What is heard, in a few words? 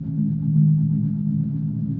120 tonewash almost a beat; SYNTH AND ELECTRONIC LOOPS